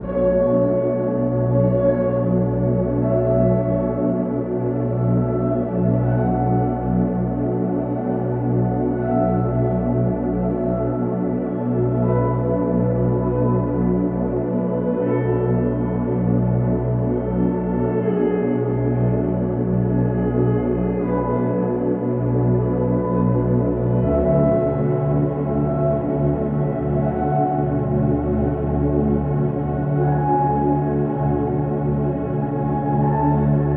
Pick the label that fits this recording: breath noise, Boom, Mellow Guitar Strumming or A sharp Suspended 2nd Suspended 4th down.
Mellow Guitar Strumming